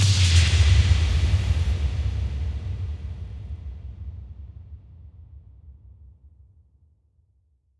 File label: bassdrum reverb FX kick; Vengeance Samples